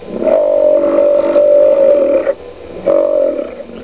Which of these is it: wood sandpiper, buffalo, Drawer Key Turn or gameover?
buffalo